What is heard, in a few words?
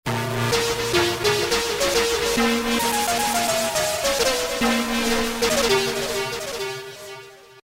Noisey Lead, Roland V-Synth; Factory Patches